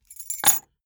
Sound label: key ring small place table hard